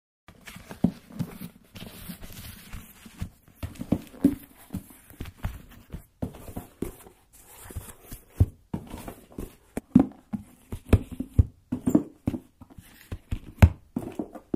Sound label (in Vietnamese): Am thanh sap xep do dac va lau don